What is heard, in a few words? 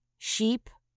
sheep